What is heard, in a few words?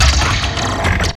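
current, Tekno Industrial; East West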